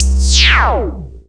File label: beam